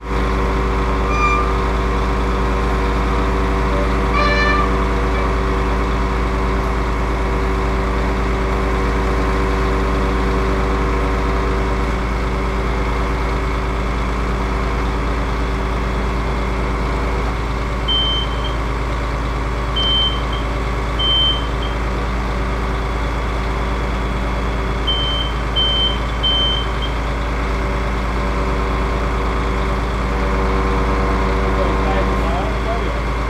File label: industrial truck crane grap operator aligning grab for lifting